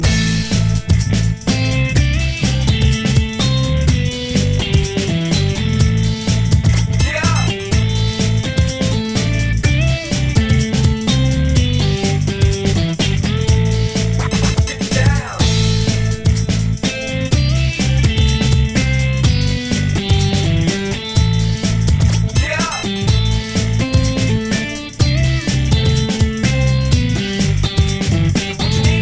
Breakbeat

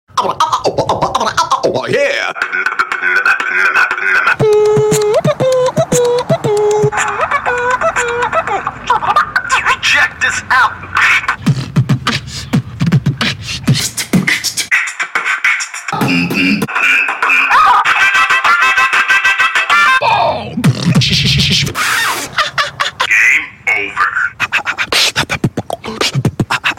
Beat Box; Galaxynexus

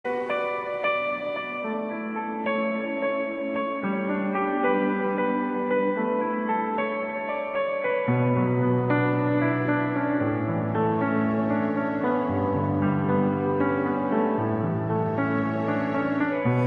Love tone

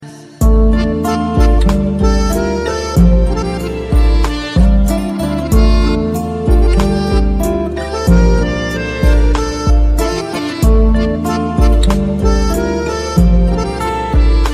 Harmonic Music

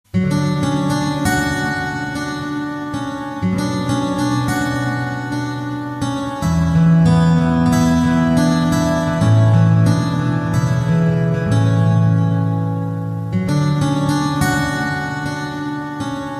Guitar Live

